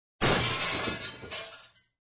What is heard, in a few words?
Glass Shattering